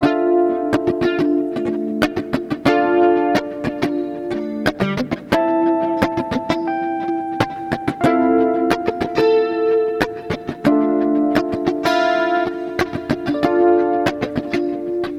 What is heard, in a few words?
Dm90 Chilled Asphalt Mix